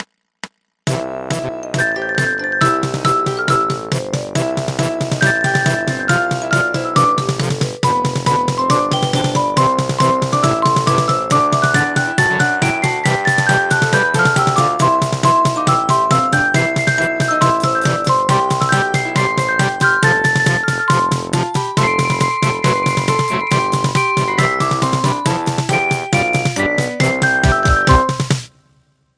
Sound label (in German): klavier halbzeit 2 4; klavier lernen fuer anfaenger lektion 10